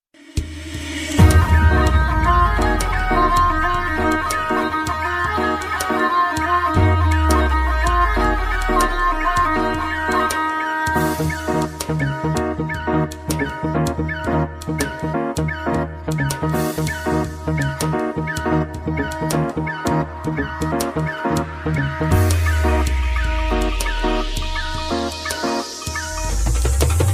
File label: Dhool Bgm Background Punjabi